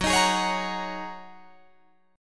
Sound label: Gb 6th Add 9th, Guitar Drop D